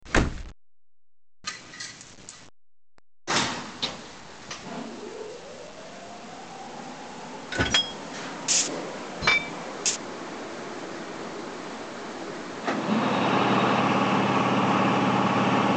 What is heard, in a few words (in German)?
Reihe 1110 OEBB, Loksound H0; Roco